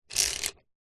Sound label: household blind shade pull cord close up; zapsplat